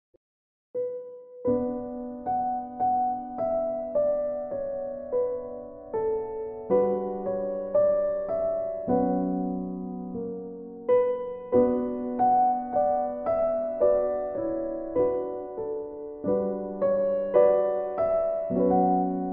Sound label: heavenly christmas music instrumental christmas songs cello piano; colinde; CANTARI